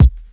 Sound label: 90s mid range kickdrum g sharp key, Royality free kick sample tuned to the G# note